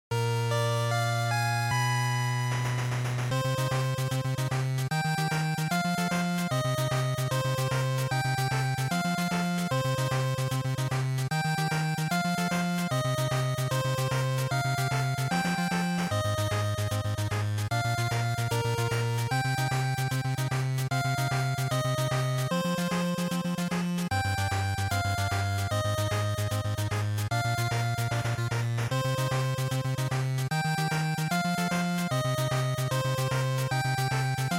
GB Action Title

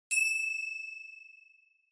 Finger Cymbals Dry Short

